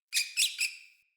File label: Messenger Rat Trapped Call A; Ambient; Vocals; Verminia; Animals